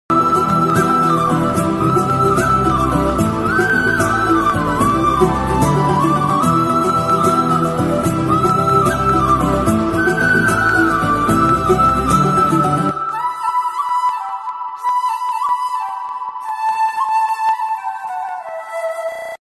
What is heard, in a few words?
tamil love bgm ringtone; Tuck Jagadish